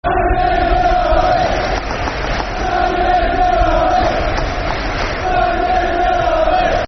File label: Football Chants (Soccer Songs), Barnsley; Simon Davey's Red Army; Barnsley Bfc